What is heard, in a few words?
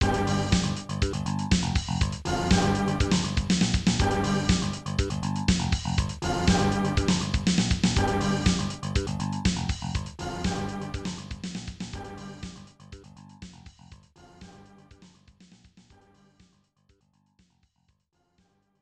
Score Count; Armored Warriors; arcade